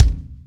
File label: normal hit clap